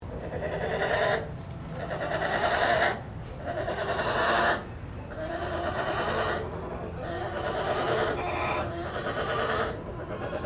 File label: sunbear cub hum